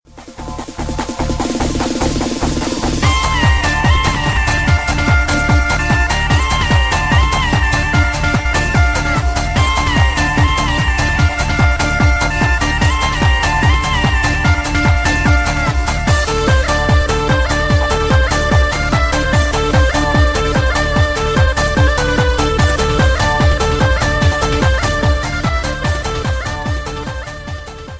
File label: Balkan Folk Mix